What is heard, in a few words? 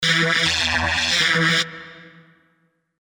Sound FX A